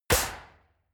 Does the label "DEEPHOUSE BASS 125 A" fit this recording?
No